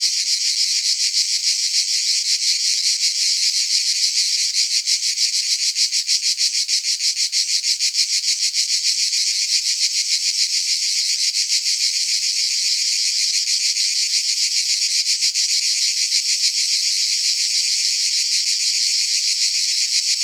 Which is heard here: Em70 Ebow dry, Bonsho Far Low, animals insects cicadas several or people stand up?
animals insects cicadas several